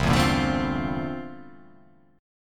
C 13th C down; C13 strummed

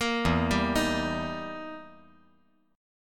E Minor Major 7th Flat 5th E down

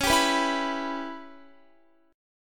Db Minor 7th Flat 5th, Guitar; strummed; x,16,17,16,16,18; 4E,4A,5D,5G,5C,6F; Dbm7b5

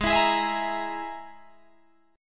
Ab Suspended 2nd Sharp 5th Ab, Guitar Drop D; 18,19,18,21,21,18; down

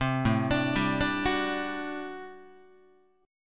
A Minor 13th A Guitar; Am13 arpeggiated; JGuitar

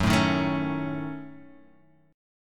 F sharp Minor 6th, guitar; strummed; 2,0,1,2,2,x

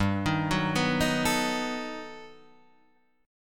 G Augmented Major 9th, Guitar, Half Step Down

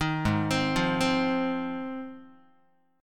Ab 6th, Guitar; arpeggiated; 6,4,3,3,4,x; JGuitar

